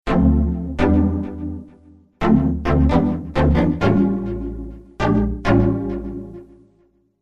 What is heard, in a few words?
Soft; d-50